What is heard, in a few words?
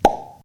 Plopp